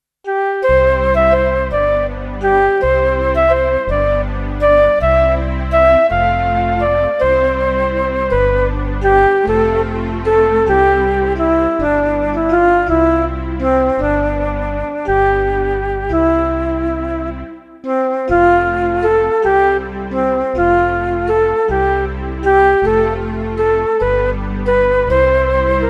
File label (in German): geburtstag ist heut keyboard